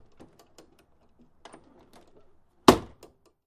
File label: Citroen Deux Chevaux, Door Open and Close. Medium Cu.